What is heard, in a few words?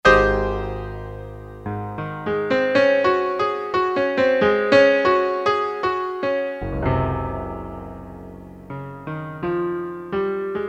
16'Piano, Korg DSS-1; Pianos; KSDU-008 Pianos II